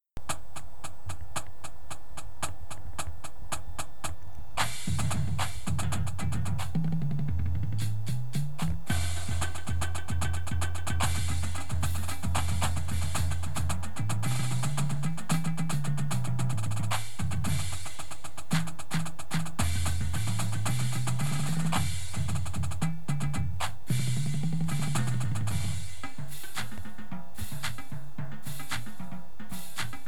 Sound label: full drumline